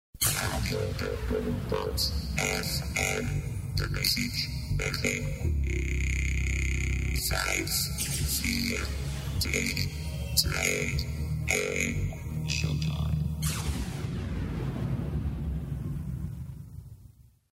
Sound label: Jingle Robot; Bloempot Fm